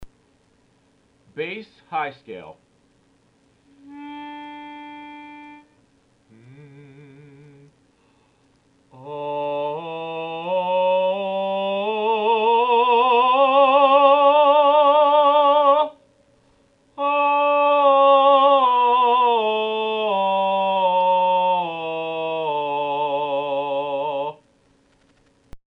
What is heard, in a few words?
bass high scale